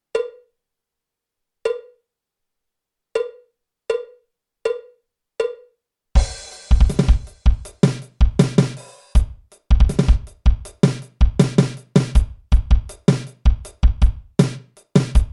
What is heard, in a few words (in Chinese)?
架子鼓独奏 爵士融合; 66条架子鼓独奏SOLO